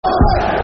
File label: Football Chant (Soccer Songs); Bristol Rovers; fanchants-com